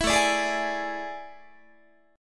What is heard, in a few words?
A Major 7th Suspended 4th, Guitar